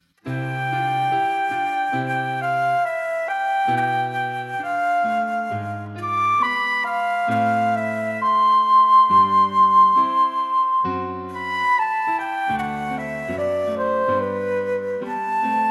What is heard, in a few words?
Flute